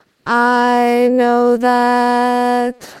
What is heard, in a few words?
singing